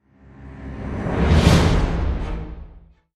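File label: STINGER THE DECAGON SWELL HIT, soundtrack; transformers war for cybertron gamerip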